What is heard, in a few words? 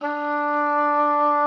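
D4, oboe d'amore; Xsample VOL-7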